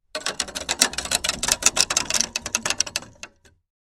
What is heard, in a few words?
Old rusty paint roller squeaking